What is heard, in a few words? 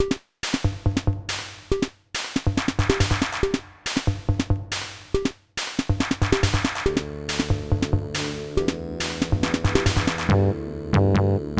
funkey